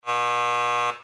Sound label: wrong buzz; think fast